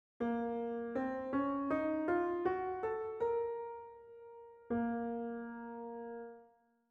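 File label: Bb minor scale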